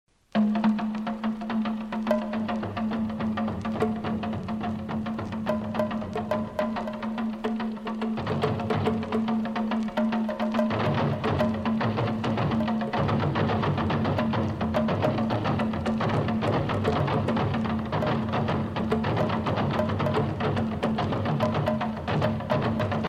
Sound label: African Funny Drums